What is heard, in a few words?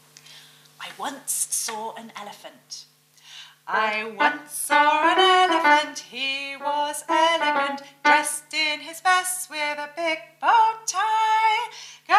I Once Saw an Elephant Vocal